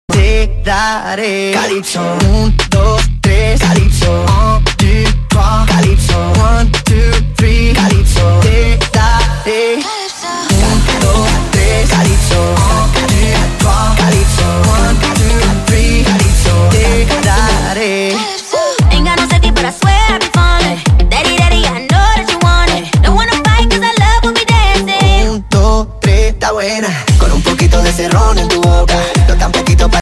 Calipso

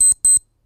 Wrist Watch Beep

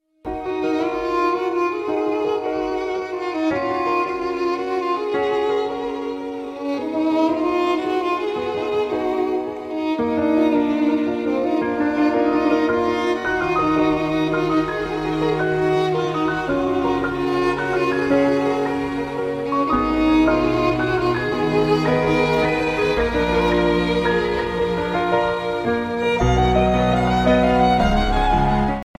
Violin; Yanny

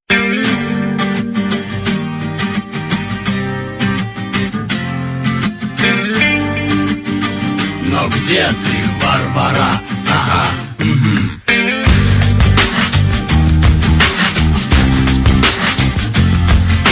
guitar

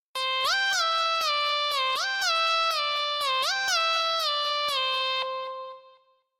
Nerdy Tune

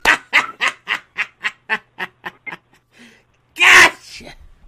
Donkey

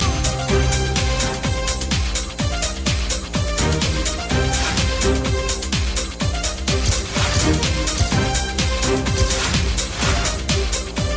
Happy Theme Music

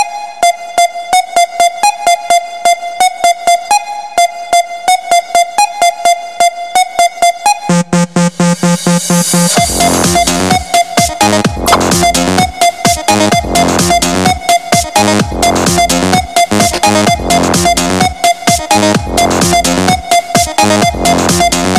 Super Bass Beat

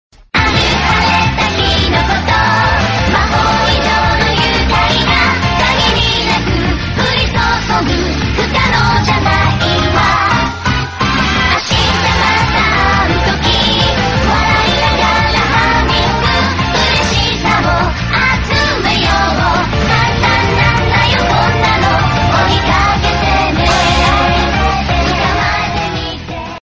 Cute Japanese Ringt

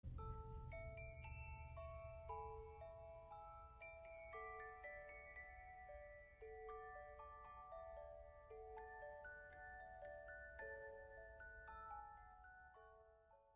Music Box

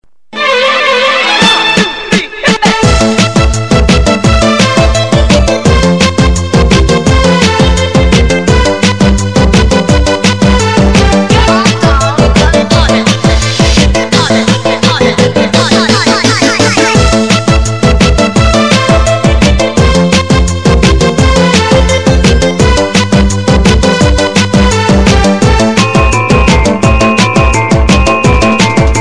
Child Beat Remix

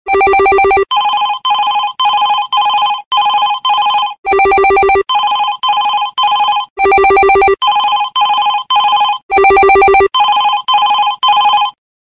A L A R M Sound; L G

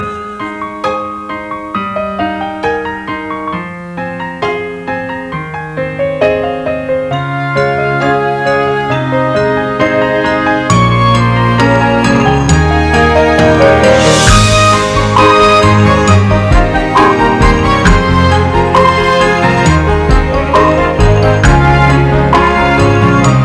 Canon In D

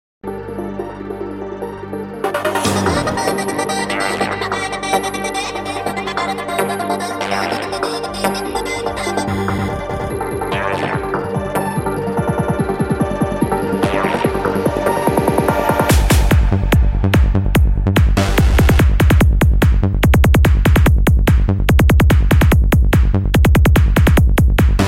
Best Beats

